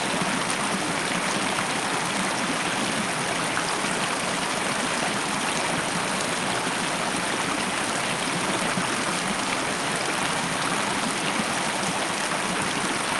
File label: stream loop; fvtt sonniss gdc audio